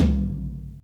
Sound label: dolphin, Toms; Real